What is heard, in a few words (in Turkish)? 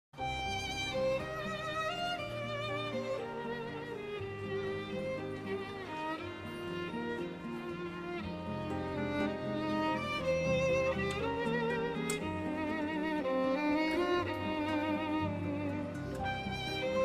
Enstrümantal fon müzik Umutsuzluk